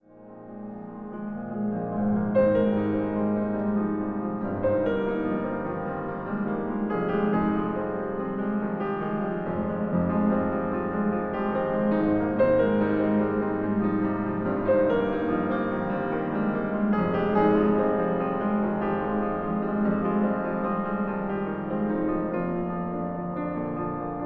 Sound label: night music